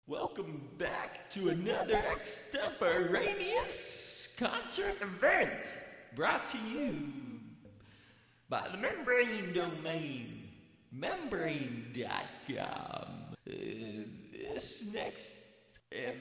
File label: guitar, 6 string electric